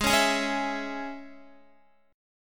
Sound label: Ab Suspended 4th Sharp 5th Guitar, chord; Absus4#5 strummed; JGuitar